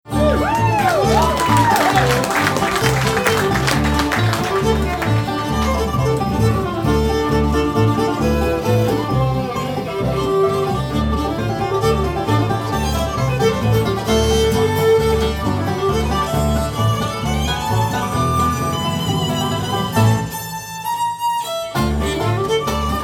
Fiddle Blues, bluegrass band

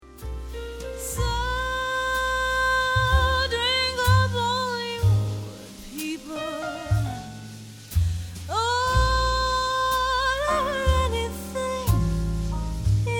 Jazz Ballad

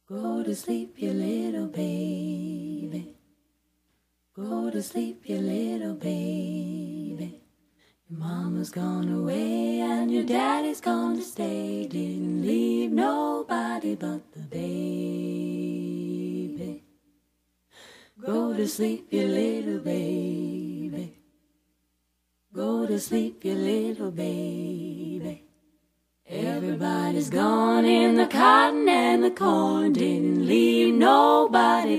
Acapella

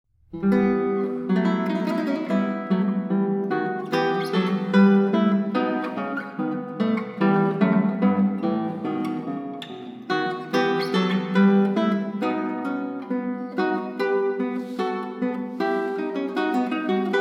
Sonata in e minor, classical guitar; Scarlatti

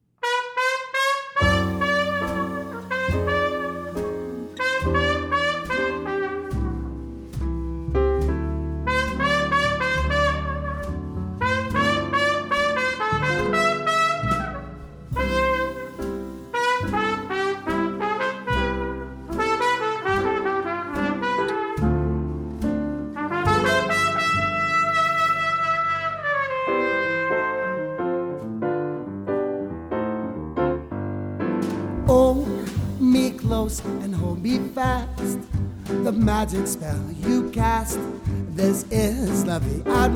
20s 30s jazz; 20s Band

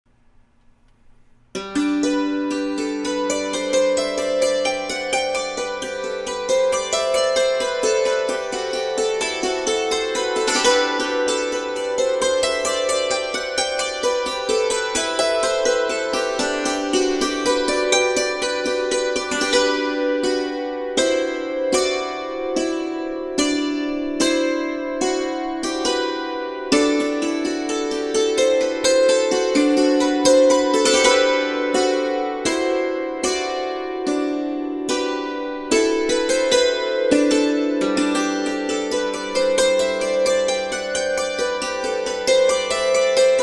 Hammered Dulcimer

